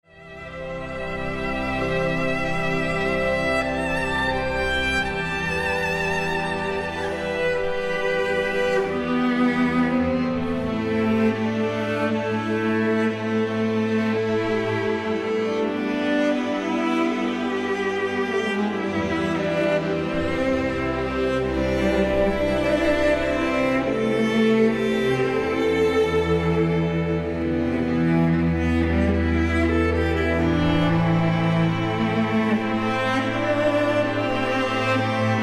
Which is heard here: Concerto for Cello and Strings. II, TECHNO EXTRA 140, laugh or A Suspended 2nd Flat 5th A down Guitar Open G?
Concerto for Cello and Strings. II